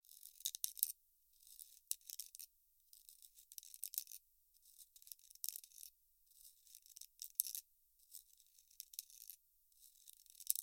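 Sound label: zazubrennie kraya suhogo lista laskaemie konchikom palca, jagged edges of a dry leaf stroked with a fingertip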